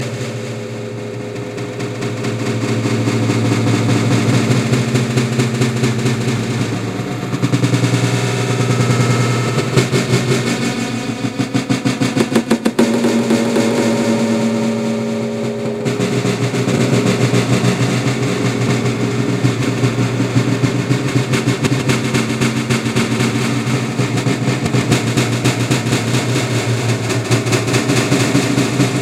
Drums Ringtone

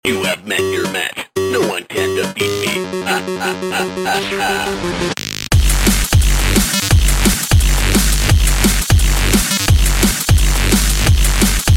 1up; electronic

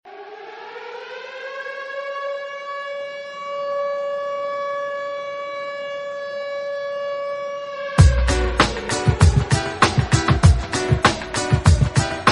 techno alarm remix, ringtones

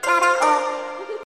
Tada

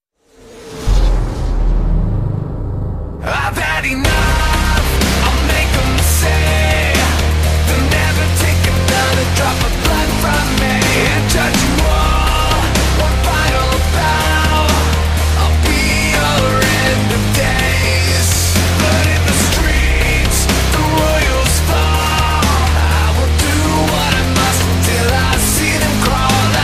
Fanfare

